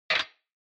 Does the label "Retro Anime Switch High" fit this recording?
Yes